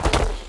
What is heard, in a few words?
Enemy Strigger Run